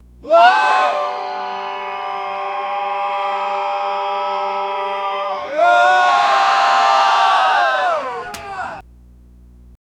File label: a massive gospel church c